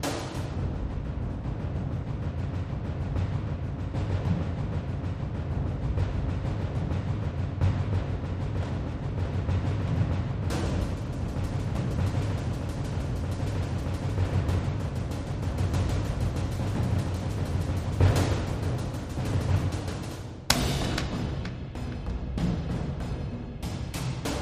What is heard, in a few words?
Historical War Drums